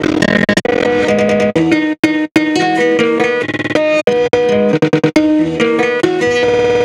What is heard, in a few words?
guitar